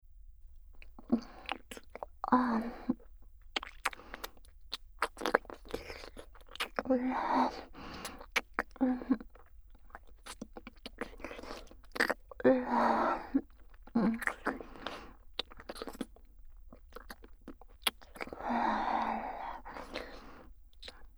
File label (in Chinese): 超 緊貼 舌吻 吐息 迴圈式 音聲; 【はだかそいね×リアル生フェラ】1週間で全力孕みにくる低音爆乳ご奉仕メイドさんとの超密着囁きお布団夜這いえっち～性欲強めダウナーJK♪強制中出しおまんこホールド～